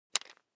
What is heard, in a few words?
object tool electric drill bit loosen; smartsoundfx